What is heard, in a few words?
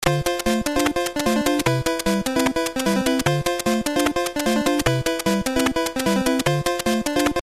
proto; smb2